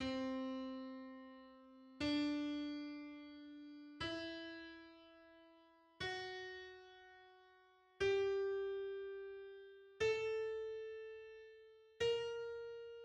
Lydian dominant C

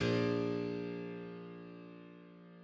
Stimmung harmonics; Stockhausen; MIDI

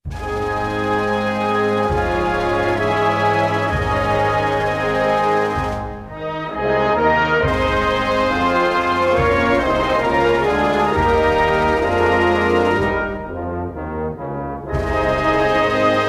National Anthem; Malta

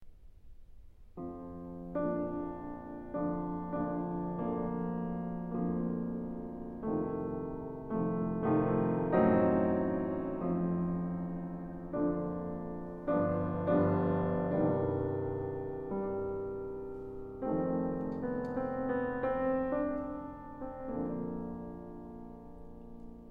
Impromptu B-flat; Schubert

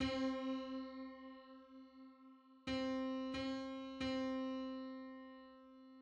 Sixty fifth harmonic on C

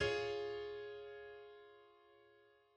Neapolitan sixth

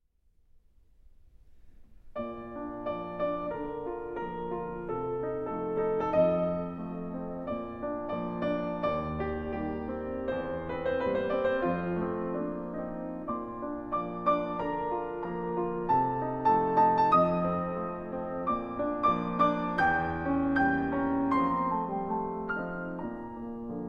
Schubert Impromptu B-flat

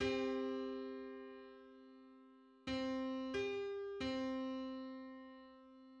21st subharmonic on C

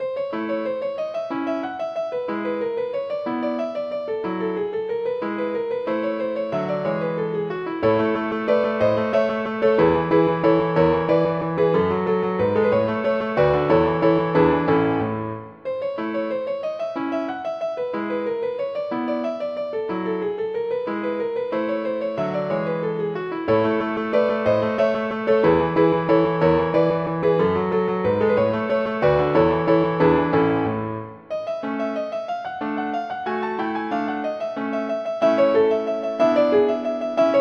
Piano Sonata No. 11, Variation 3

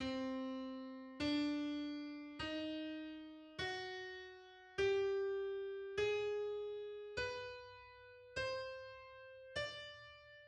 Algerian scale

